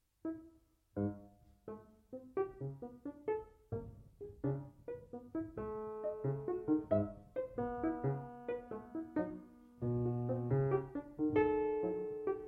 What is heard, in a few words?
piano sketches